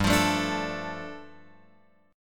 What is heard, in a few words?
G 7th Suspended 2nd G, Guitar Open F; strummed; JGuitar